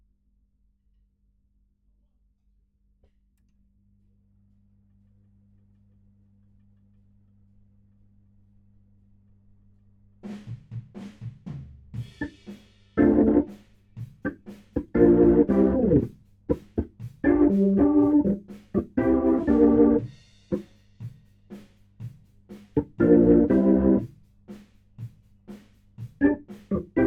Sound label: HAMMOND BOT